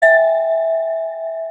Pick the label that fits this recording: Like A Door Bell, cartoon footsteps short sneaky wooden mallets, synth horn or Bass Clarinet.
Like A Door Bell